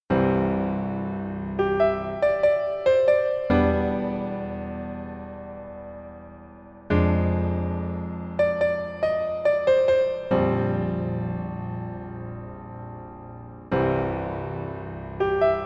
PIano ver 멜로디MR

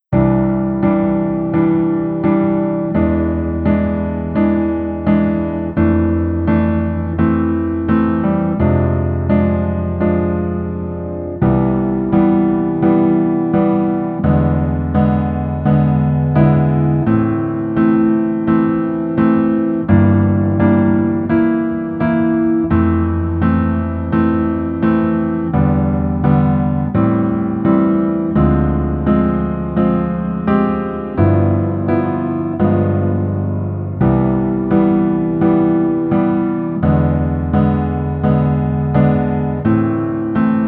Piano ver 남자 키

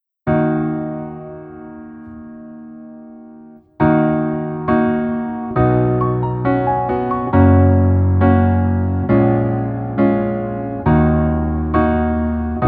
Piano ver 멜로디MR 여자키

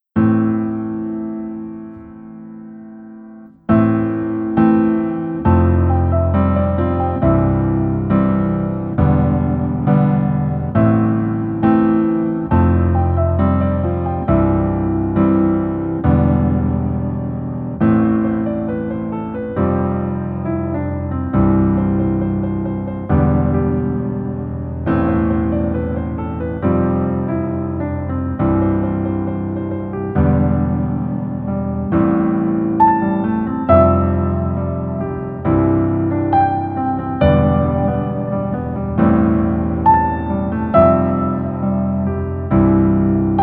Piano ver 멜로디MR -1키

